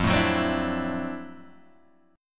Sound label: D 9th F down Guitar Standard; strummed; JGuitar